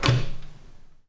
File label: Foley Mid, Drum Bits, Sweeteners; layering